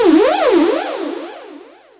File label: alarm, FX & ATMO, ALARMS & ALERTS; Techno Trance; Techno Trance Essentials